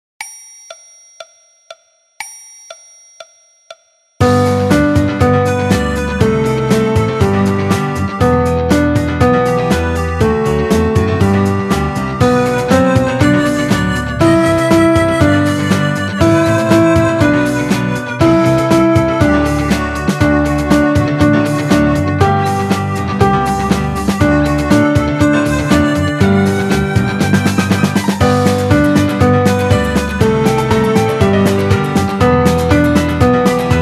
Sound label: Piano Orch; Teran; ترن